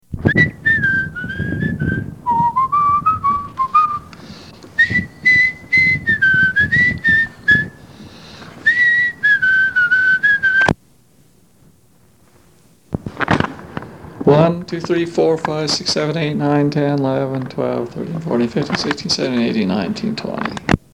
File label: Wistling & Counting; H Tracy Hall; Misc Tapes of HTH